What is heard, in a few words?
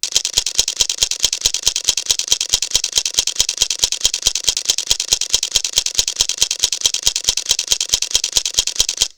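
Sony Alpha DSLR-A900 continuous high JPEG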